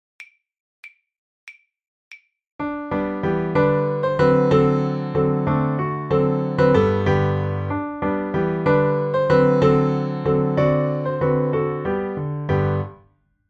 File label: accomp, violin; The Violin Fun Book (Level 2); Prep for Go In and Our the Window